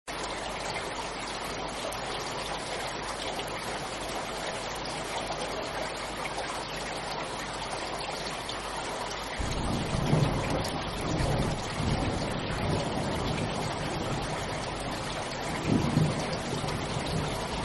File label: soundscape rain loop; 10 minutes; karrakula soundscape dragan rain 10 minutes loop